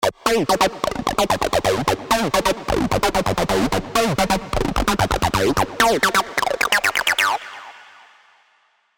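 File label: Elektroshaker, 303 sequences; Spire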